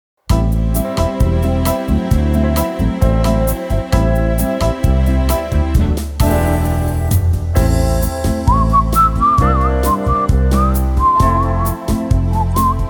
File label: instrumental; Helt Konge